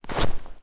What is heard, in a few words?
snow footstep